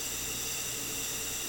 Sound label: steam pipe loop; Half Life Alyx